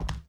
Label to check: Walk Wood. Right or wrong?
Right